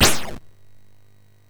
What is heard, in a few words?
SFX, MOS6581; Narco Police